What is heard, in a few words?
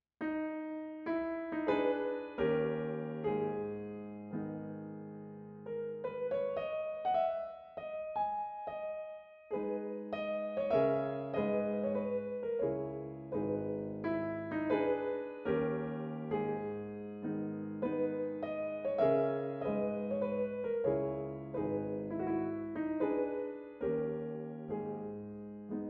Mazurka in G-sharp Minor, pianoteq; Chopin; Op. 33, No. 1